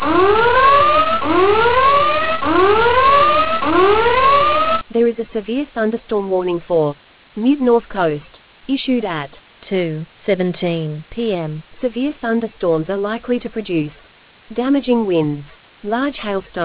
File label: storm audio